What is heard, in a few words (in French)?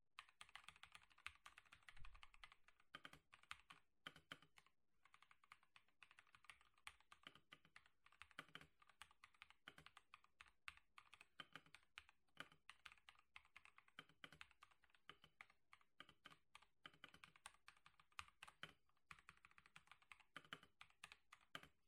enregistrement son, Alienware Pro